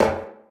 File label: pipe step; Golden Crayon Sounds